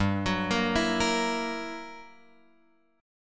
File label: G Minor Major 13th, G; {3 X 4 3 5 6} arpeggiated